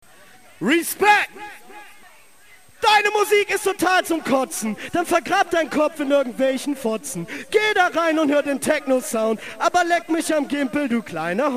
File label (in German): Hauptbuehne Acapella; Hanfparade 2003